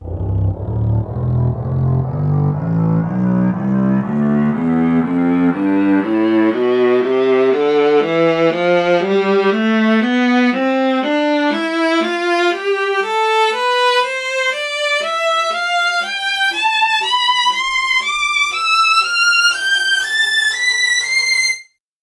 viola tonleiter

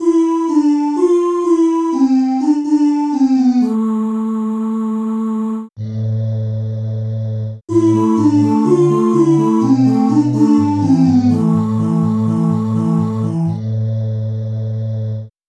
ohh voices

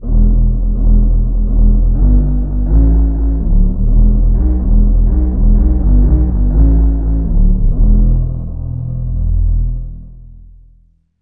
calliope lead bass

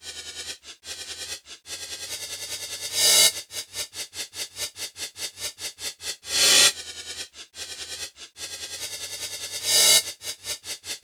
reverse cymbal

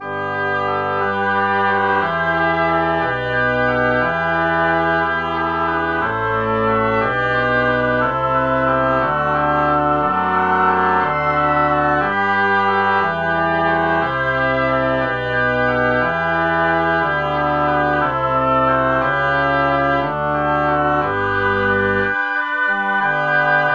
oboe bach samples

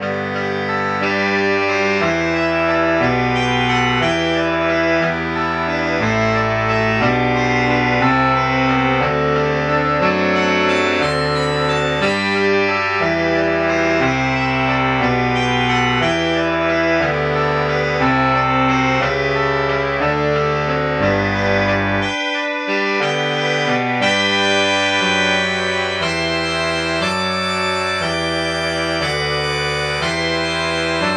tenor sax

